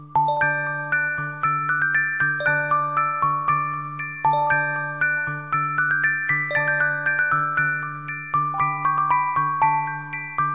Happy Music Box

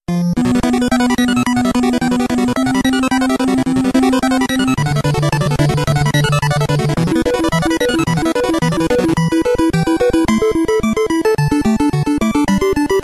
WakeUp Sound

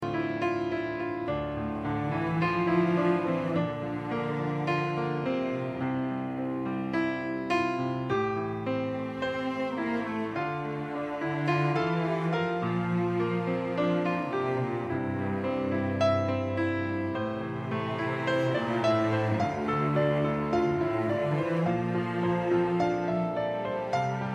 Piano Valentine Tune

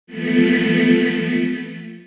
Meditation

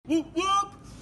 Woop Woop

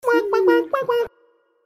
Notific; Bob Ending

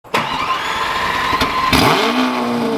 Aventador start

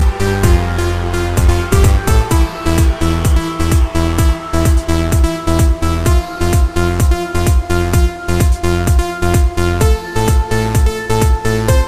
Electro

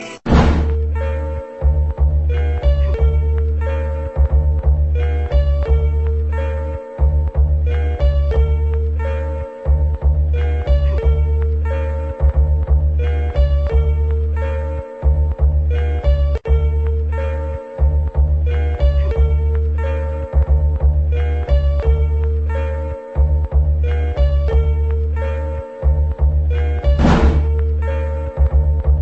Scary

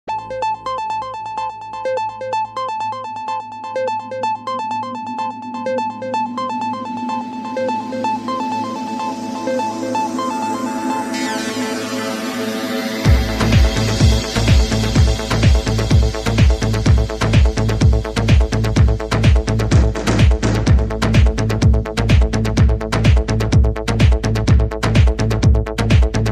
Hard House

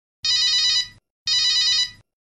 Classic Ring

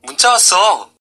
Zen Text Tone

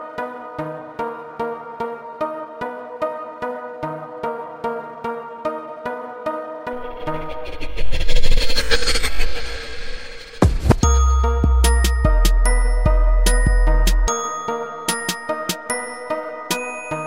Hip Hop Intro

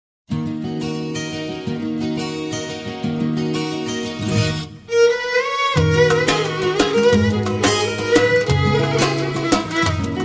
Nice Melody

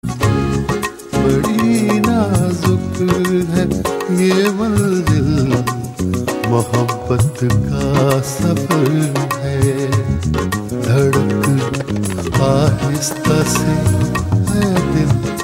Gazal Tone

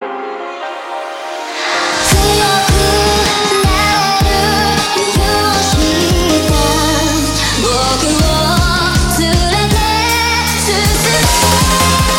Eurobeat

